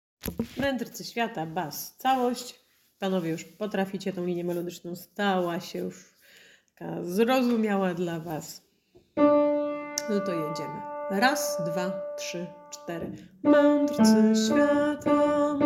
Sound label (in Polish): Bas calosc; Medrcy swiata; Boze Narodzenie